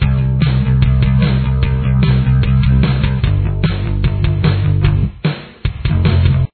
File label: bass